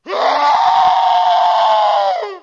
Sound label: faceless pain